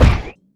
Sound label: heavy feet, footsteps; player; Dystopia